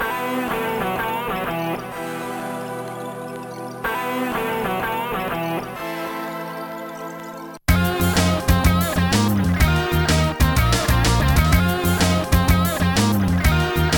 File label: playing that guitar; Trackers; Modland; Liquid